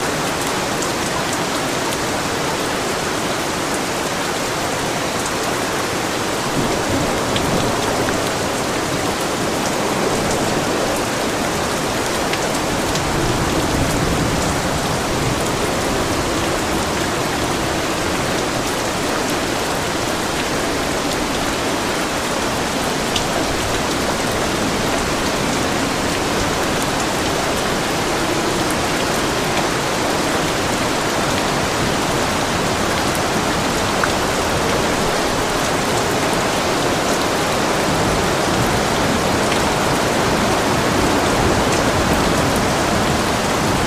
Rain Ambience; Wii U, Xbox 360; gamerip; Angry Birds Trilogy

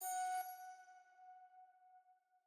Alchemy Flute Combo

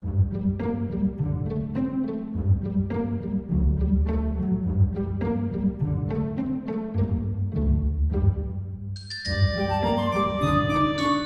Happy Song; keepsake; zak

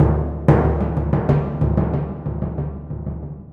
Timp

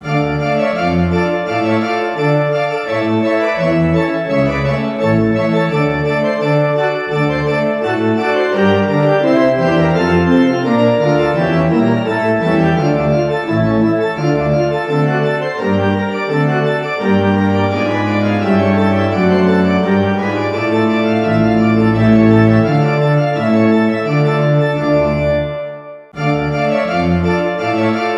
Monster, reveal music; Birthday Party Bash; Wii

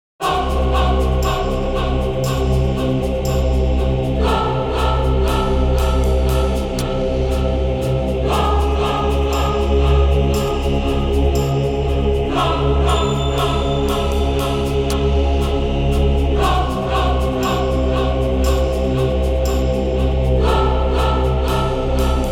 Ominous; front mission 1

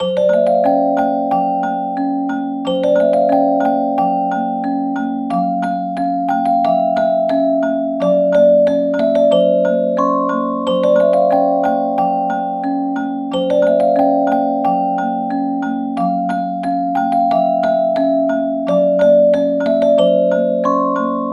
Babylab Song

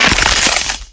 Rifle; MilkChoco

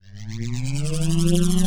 Warp Portal Appearing

